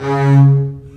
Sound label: String Collection, MARCATO